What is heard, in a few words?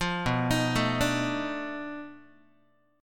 B 7th Sharp 9th Flat 5th, down, Guitar; B7#9b5 arpeggiated; JGuitar